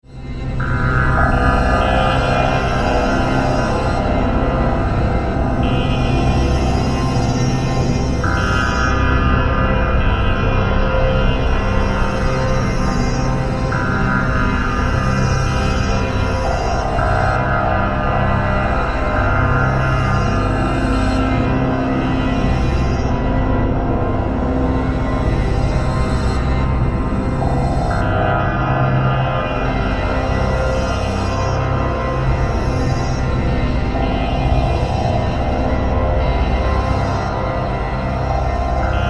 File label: Synth, Stab, Drone, Horror, 5.1